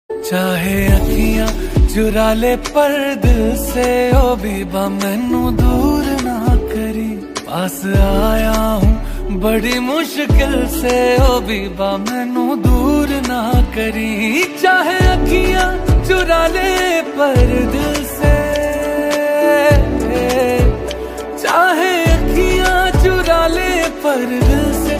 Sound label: song ringtone hindi